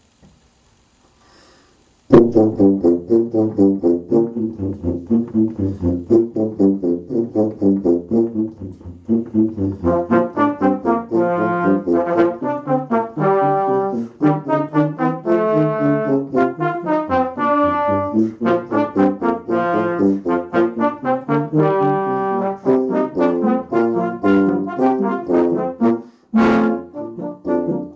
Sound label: jingle bells